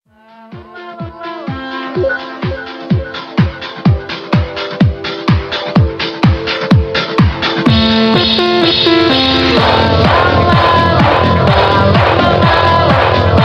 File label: hardstyle slowed remix; tiktok remix